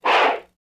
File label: Horse Bull Snort Firm